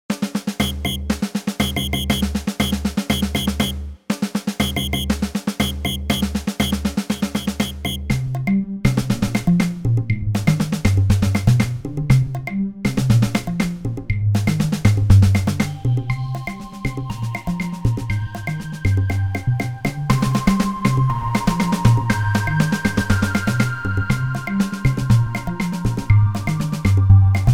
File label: etude snare drum synth 120 bpm